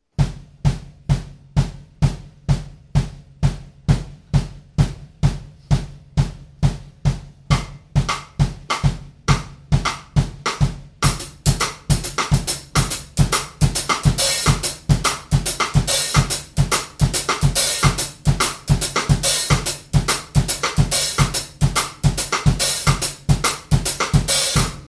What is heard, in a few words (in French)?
bikutsi 3 4, batteur